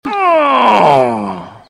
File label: stun; shrek